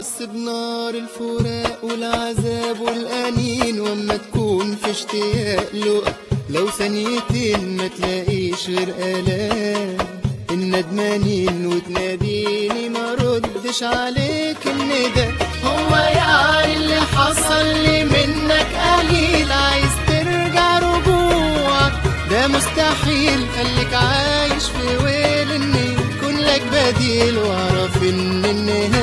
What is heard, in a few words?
arabic sad ringtone